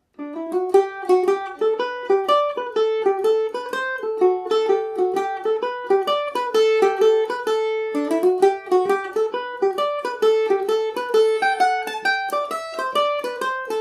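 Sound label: new moon g major, mandolin; The New Moon; Falder mandolin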